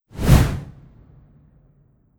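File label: Fire Elemental Inferno swoosh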